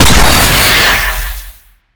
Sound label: demon hit